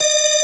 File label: B3 E 4, ORGAN; VOCAL ORGAN